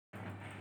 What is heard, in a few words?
silent